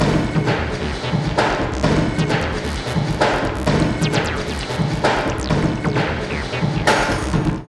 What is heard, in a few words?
LOOP, Tekno Industrial; East West